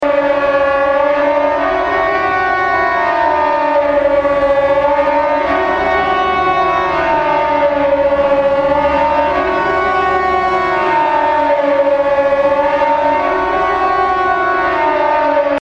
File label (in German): Alarm